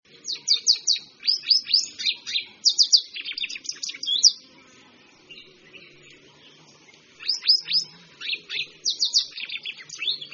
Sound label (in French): bergeronnette pie, chant